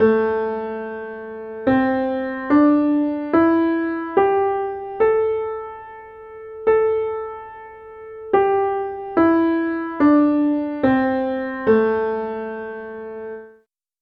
pentatonic minor scale

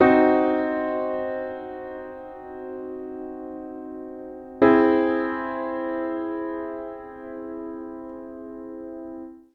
diminished and augmented triad

